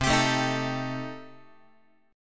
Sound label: C Minor 6th Guitar, chord; Cm6 strummed; JGuitar